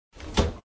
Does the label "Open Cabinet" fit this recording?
Yes